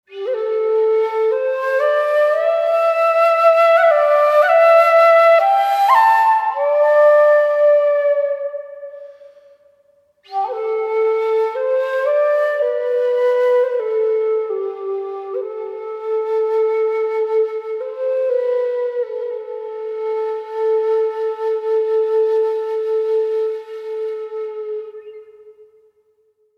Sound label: Field BGM; Xenoblade Chronicles 3